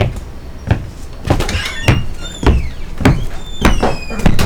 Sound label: Walking And Opening Door